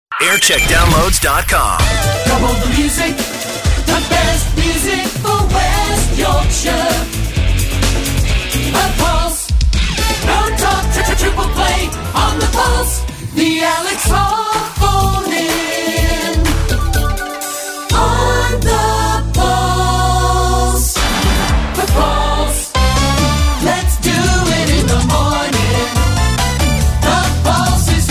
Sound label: jingle montage; The Pulse West Yorkshire; JAM; 1995; 1990s